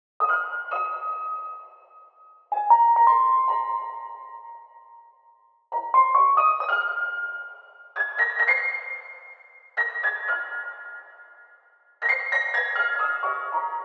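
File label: High Piano 132 A minor Dry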